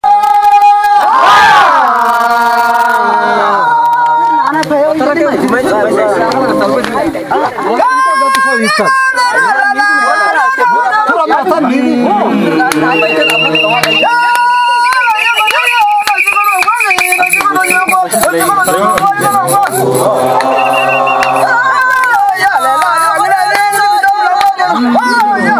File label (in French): chant hamers, ethiopie